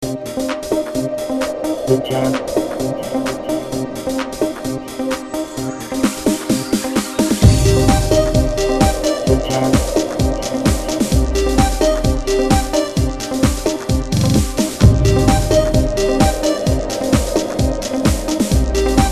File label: Jingle; Bitjam